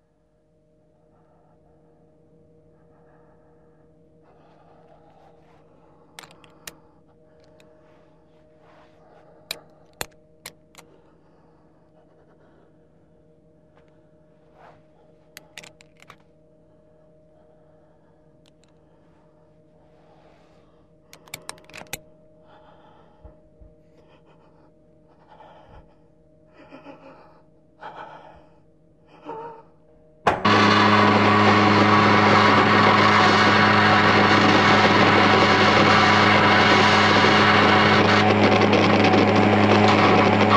Death By Electrocution; horror sounds; Hollywood Edge

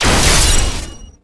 Pickup Sword Powerup

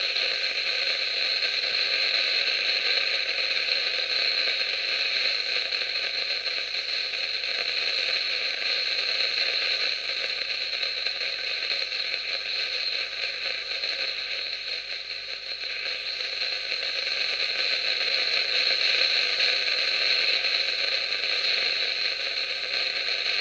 walkie-talkie interfeerence; devices accessories utilities; NS Studios - ultra sound collection

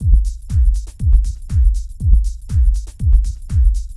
Music Distant Car Deep Loop; SFX Kit; Foley - Misc

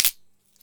matches break; best service Blue Box

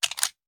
Machine Gun Foley; Sound Ideas; Guns

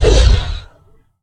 MONSTER Growl Deep Short; MONSTERS & CREATURES; mono; Universal Sound FX

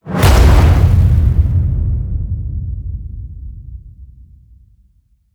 HIT Fire, Cinematic Hits Designed

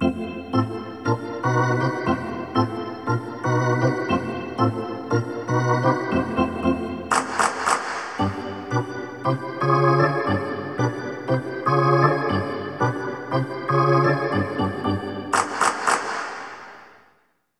Hockey Organ

